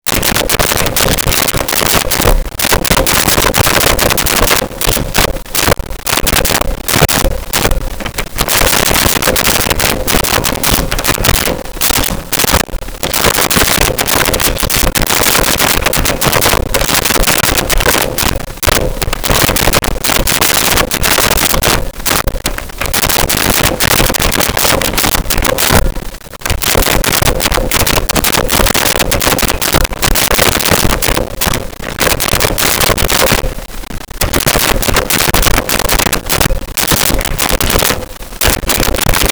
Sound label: computer keyboard